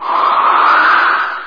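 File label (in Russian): ветер шумный близкий короткий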